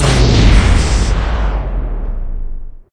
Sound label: emp